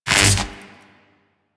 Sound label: Mining Laser Fire Stop; Weapons; Eidolon Plains; Warframe; Eidolon Mining Lasers